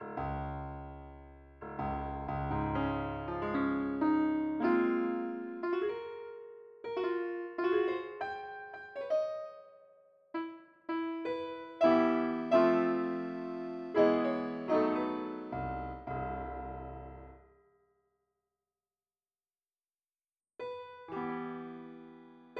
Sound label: piano